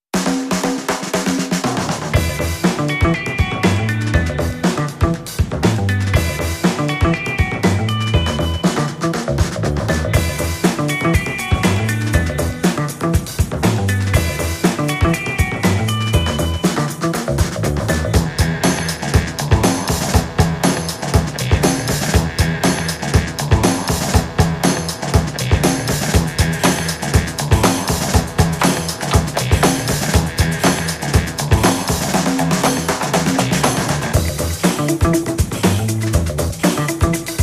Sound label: Funk